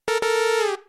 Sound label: error; megaman x